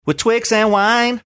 singing with twix and wine

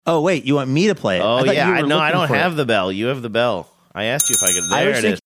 bell dings

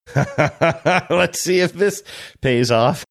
laughing saying let's see if this pays off

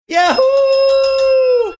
yahoooo

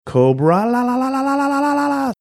la la la la la la

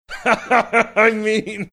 laughing saying i mean; stugotz

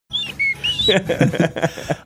whistling through teeth

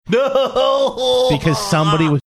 sounds utter disgust

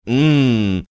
"mmm"

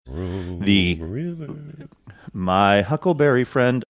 singing chrome river

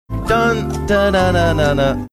singing along with theme

